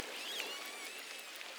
Orcinus orca

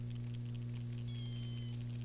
Globicephala melas

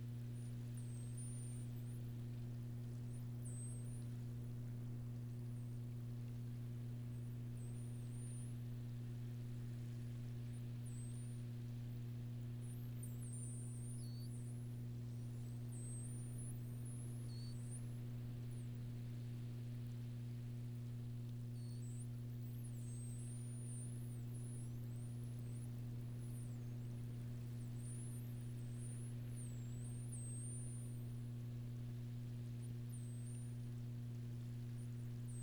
Striped Dolphin whistles

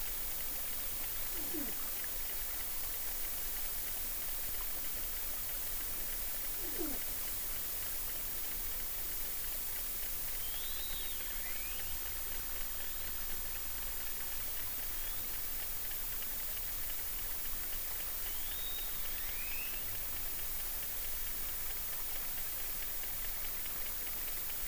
Lagenodelphis hosei clicks and wolf whistle

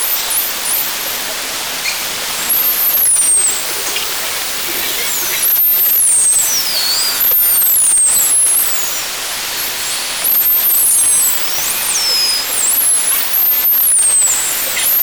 bottlenose dolphins

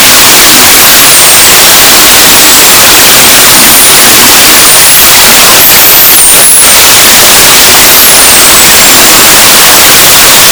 common dolphin whistles

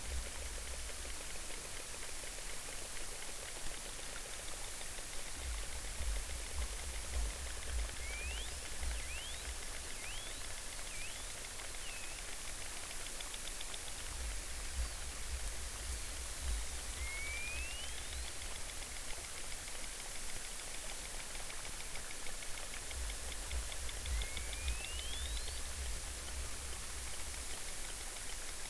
Lagenodelphis hosei whistles

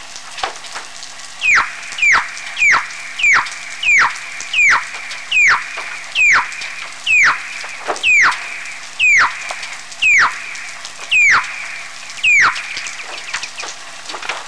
Weddell seal uw P calls

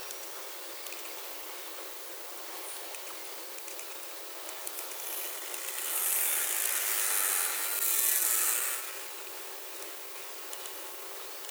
Arnoux beaked whales

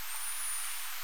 Pseudorca crassidens Whistle

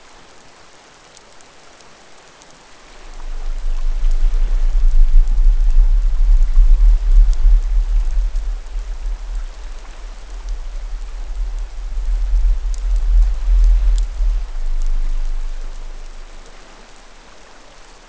Low Freq Splodge

